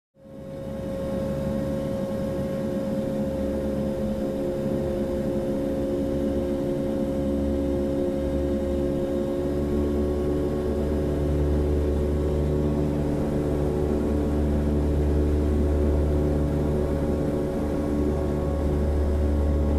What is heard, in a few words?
Light Aircraft Cabin Ambience